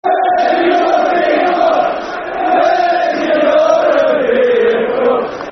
Football Chants (Soccer Songs), Liverpool; Where's Your European Cup's; Liverpool Lfc

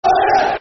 Who Are Ya, Football Chants; Stoke City Scfc

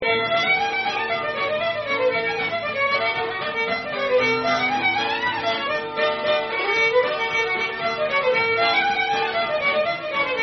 Hornpipe; Nelson Ricketts Hornpipe

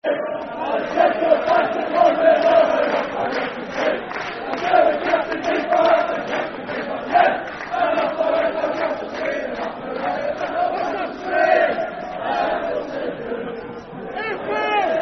Football Chant, Soccer Song; Castle Street; Stockport County Scfc

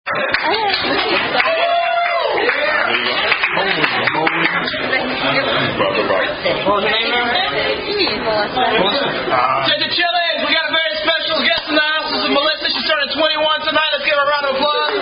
Happy Happy Birthday; 21st...uhhh....26th Birthday; Chili's

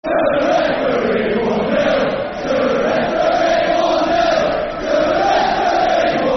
Football Chant; Soccer Songs; One Nil To The Referee; fanchants; Huddersfield Town Htfc

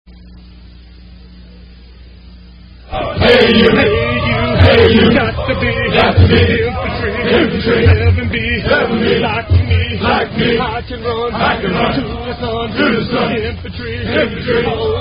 Airborne Rangers Cadence

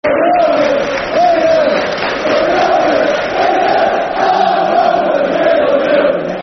We Love You Rovers, Football Chant (Soccer Song); Doncaster Rovers Drfc

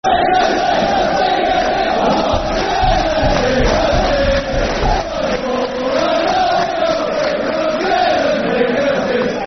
I've Got A Shed, Football Chants (Soccer Songs); Sheffield Wednesday Swfc

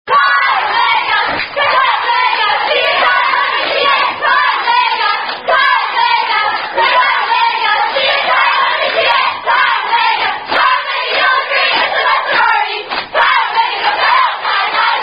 sorority song; Chi Omega; Tune Hurray For Johnny (Variant)

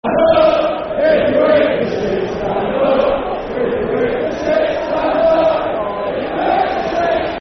Stand Up If You Hate The Shit, Football Chant; Bristol Rovers Brfc

